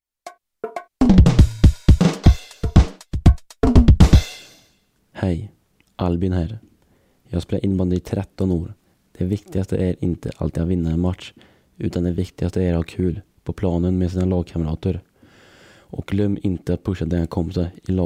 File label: drums